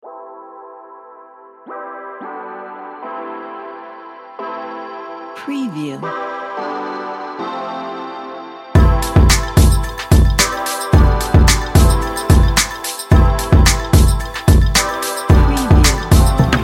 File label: future bass